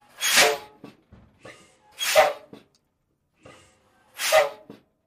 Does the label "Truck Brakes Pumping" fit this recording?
Yes